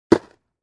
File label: Basball Helmet Toss; baseball fx & games